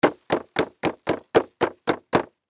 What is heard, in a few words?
Dust Pan Tap Dust, Dust Pan Tap Dust Into Garbage; Cleaning; Household; Blastwave